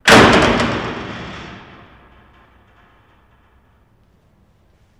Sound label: Jail Door Close Ratt; jail & prison doors